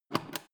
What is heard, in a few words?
foot switch turn on off quick